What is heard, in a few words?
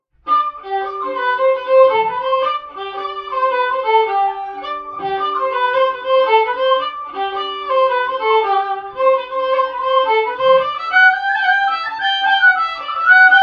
Violin; Assassin's Creed Syndicate